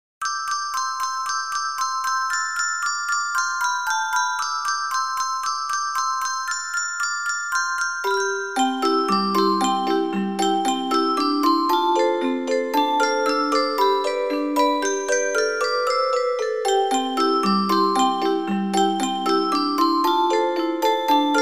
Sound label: jingle bells, xmas